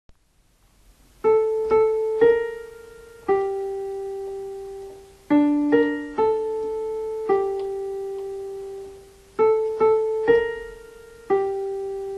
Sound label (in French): pie jesu voix alto